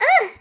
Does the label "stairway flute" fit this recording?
No